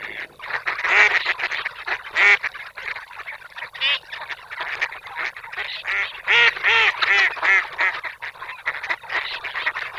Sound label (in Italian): GERMANO